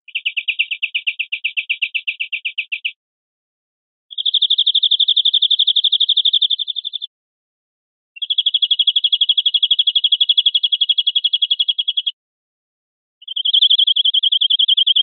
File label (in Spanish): chorro campaneado